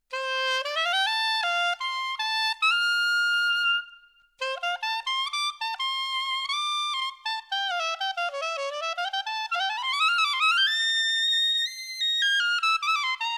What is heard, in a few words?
SOPRILLO; timbri